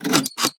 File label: Safe Press; peace death 2017 pc